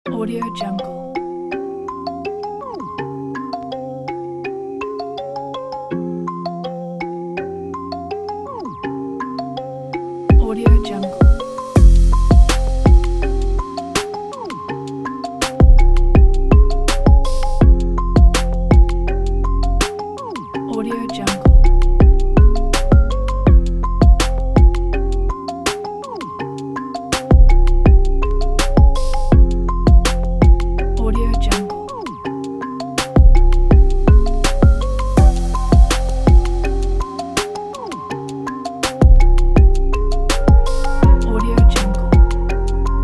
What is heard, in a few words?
powerful bass trap beat; beatroofstudio